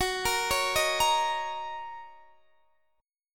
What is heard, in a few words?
Gb 6th Gb down Guitar DADGAD